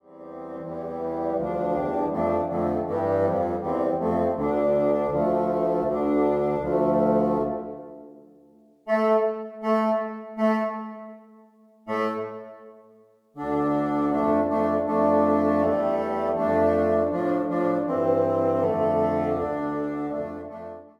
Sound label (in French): Traditionnel Kis kece lanyom La jeune fille en blanc 3 bassons